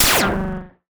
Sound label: Cwejman S1 - Good Zap